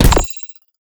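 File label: dark hole, impact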